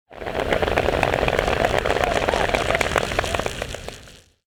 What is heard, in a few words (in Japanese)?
habataki